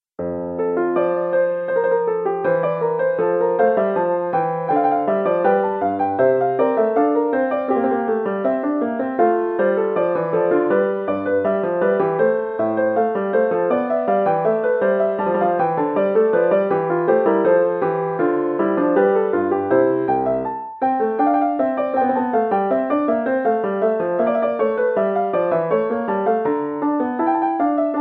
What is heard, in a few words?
inventions a 3 voix piano; bach johann sebastian